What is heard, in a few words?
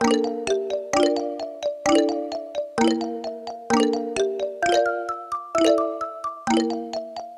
clockworks; Loops; Electronic